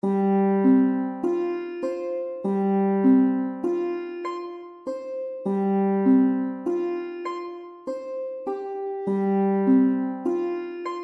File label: sitar; AJ Hipkins